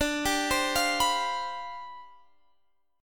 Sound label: C Major 7th Suspended 2nd Suspended 4th D, chord